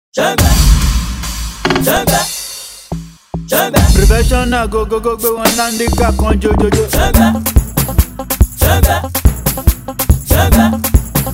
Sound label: Professional Beat